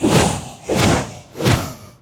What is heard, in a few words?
Prisoner Attack